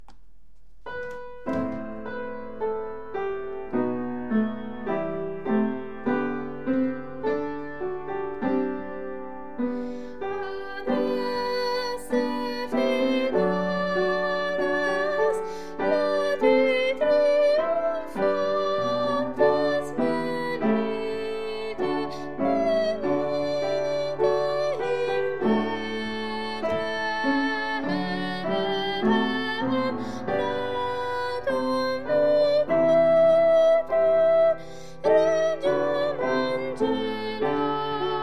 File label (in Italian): adeste tenori